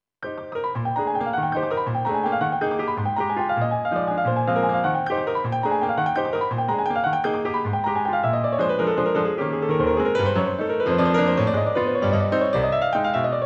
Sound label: mpc5000 piano; akai